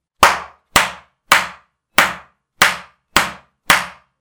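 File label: slipper sound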